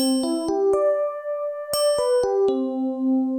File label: Alarm